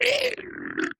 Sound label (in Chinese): 死亡声; 炽足兽